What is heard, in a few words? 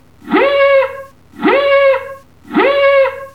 Alarm